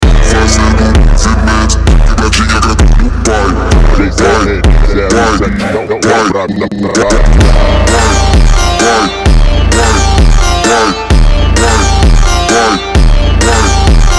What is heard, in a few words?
phonk montagem